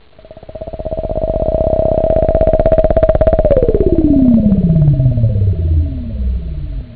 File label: wuaehh, whoosh; da capo vol1; instruments